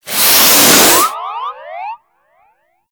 Balloon Inflate; Festivities; Warframe; Follie

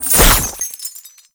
Chain Detach; Roathe Summon Catenach; Warframe; Tau

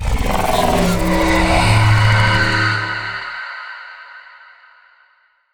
Vocal Portal Exit; Dialog; Warframe; Zariman; Void Angel

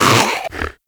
predator vocal tranq dart grunt; Warframe; Hunting Critters; Characters

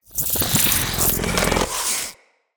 Aoe Shockwave Charge Up

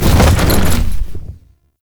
Horse Glide Wings Retract; Warframe; Duviri Horse; Vehicles

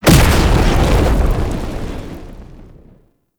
Cast Impact; Warframe; Uriel; Infernalis

